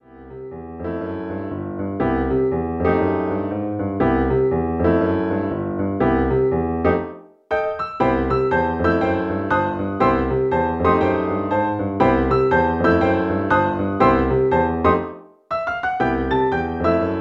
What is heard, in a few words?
piano duet